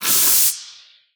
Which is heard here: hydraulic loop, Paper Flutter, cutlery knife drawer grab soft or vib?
hydraulic loop